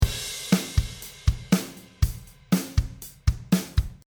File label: drummer